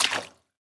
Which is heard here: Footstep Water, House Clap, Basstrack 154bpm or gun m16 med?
Footstep Water